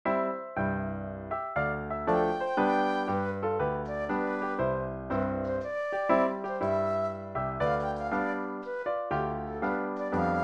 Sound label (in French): Mazurka Autrichienne